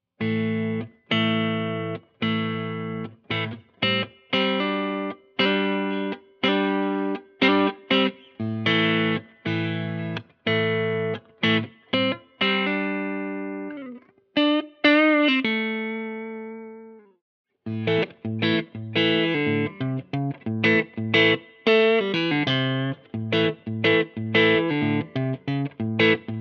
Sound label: Neck, pickups